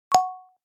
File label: Bright pop up